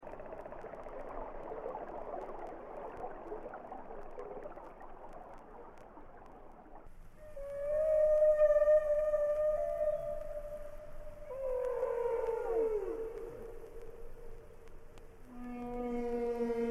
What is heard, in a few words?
humpback song, humpback whale